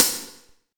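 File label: hi-hat, drums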